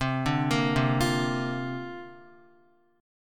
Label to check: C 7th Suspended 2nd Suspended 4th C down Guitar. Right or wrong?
Right